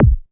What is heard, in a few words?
electro kick; Electro Kit; STYLE KITS (Hip Hop, Etc); Boy In A Band Electro Kit